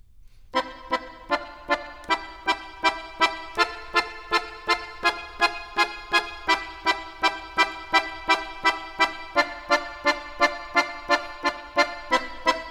Accordion, Jazz for piano

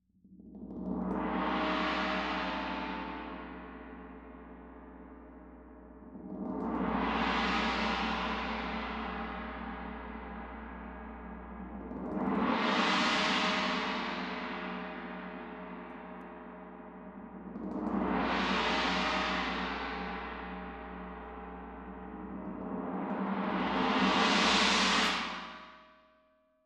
Tam-Tam Close

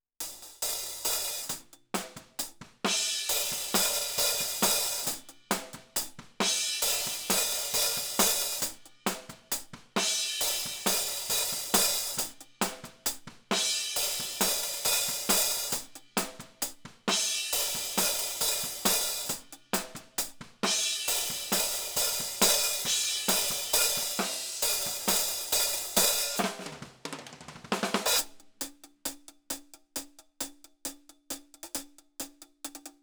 Hi Hat

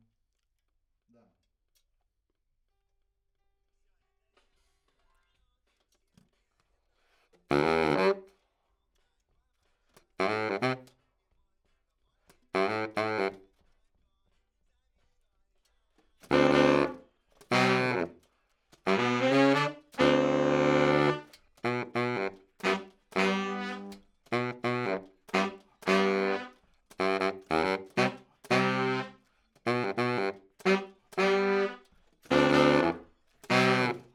Bari, RNR for piano

